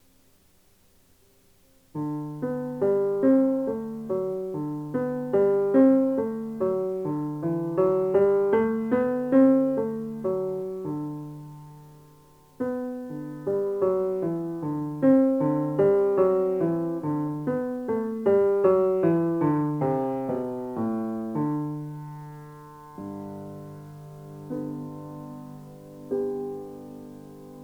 Etude for the Left Hand

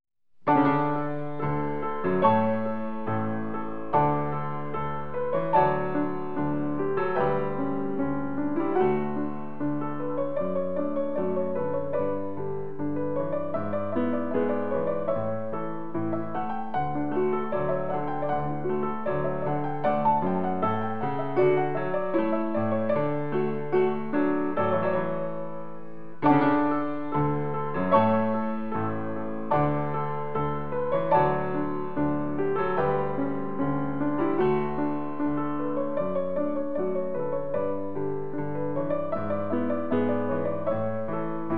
Piano Sinphonia

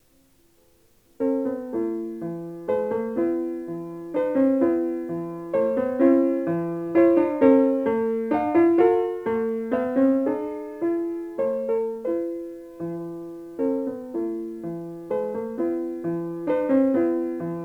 Valse

